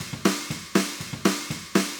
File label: Overheads; Meiconium; Sony; ACID Pro 7.0